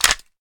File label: rifle mag out; Weapons; Guns